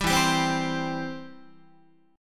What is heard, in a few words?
E Minor Sharp 5th, Guitar, 4A,4E,5A,5D,5G sharp,6C sharp; Em#5 strummed; JGuitar